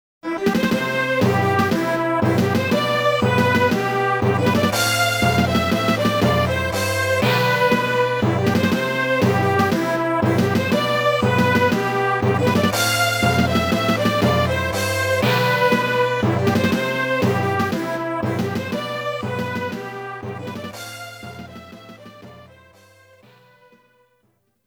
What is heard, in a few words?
March; Aggressors of Dark Kombat